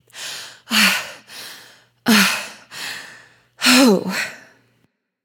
breath; smite; themorrigan

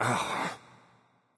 grunt ugh; bacchus

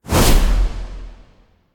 dash; Smite; Cabrakan

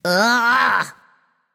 "ugh"; smite; voicepack; nike

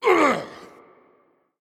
hitreact human male large; smite; npc; roman archer

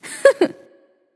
hehheh; charybdis

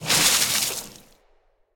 fire impact; smite; poseidon

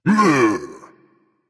tongue berserker; cuchulainn

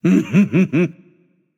heh heh; smite; kumbhakarna

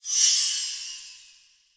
sparkle; smite; chiron

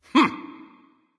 "heh heh"; smite; voicepack; ullr

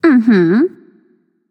agree, voicepack; smite; pele

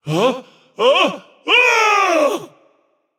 fear; smite; ra

